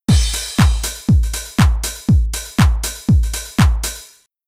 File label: Rhythm Edrum; Prog